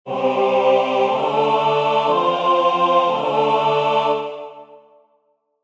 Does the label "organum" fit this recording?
Yes